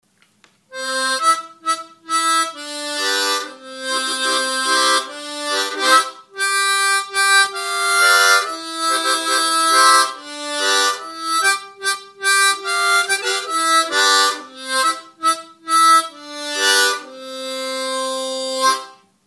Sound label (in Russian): Украинская народная песня «Несе Галя Воду», сыгранная октавами с блокировкой языком, губной гармошке